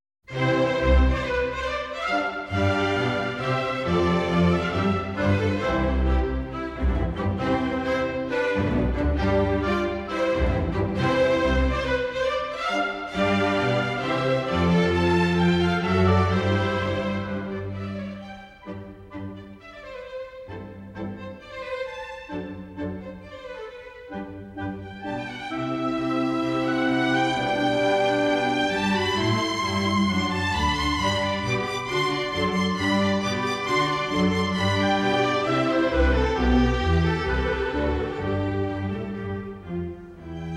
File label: Cello Concerto in C; Jacqueline Du Pré; Haydn